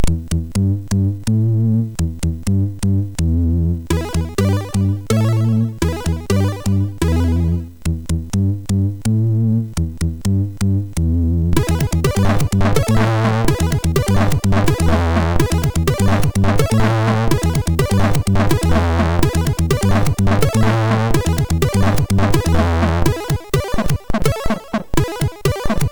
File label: Music, MOS6581R4